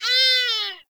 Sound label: Forest Animal Cries; Warframe; Grineer Forest